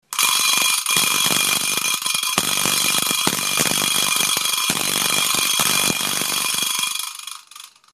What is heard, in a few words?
Vibraslap